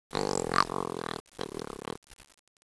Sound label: lizard; cstrike; animals